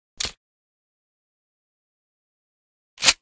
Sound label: toz34 bore; weapons